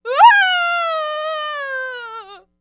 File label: Enemy Generic Human Death Falling; Rampage